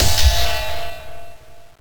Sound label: gem locked